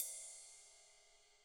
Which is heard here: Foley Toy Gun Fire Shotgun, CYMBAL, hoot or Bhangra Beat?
CYMBAL